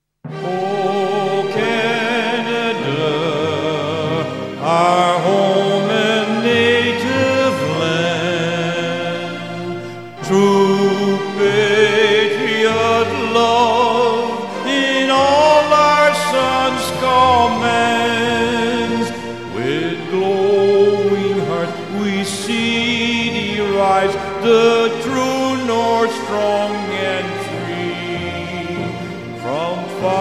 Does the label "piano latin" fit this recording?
No